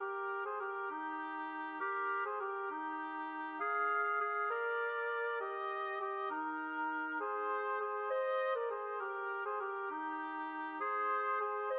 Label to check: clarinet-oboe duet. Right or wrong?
Right